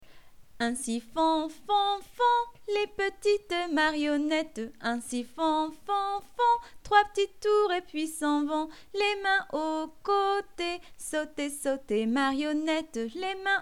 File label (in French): Ainsi font font font chant